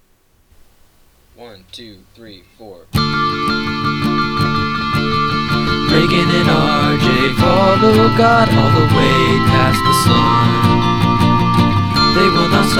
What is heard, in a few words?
Theme Song